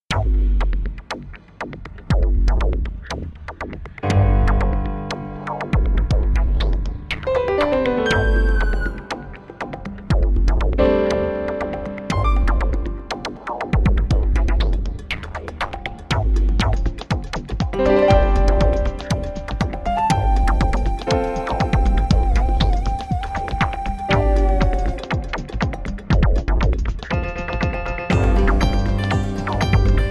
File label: contemporary urban pop